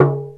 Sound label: AFRICAN INST; OMI - Universe of Sounds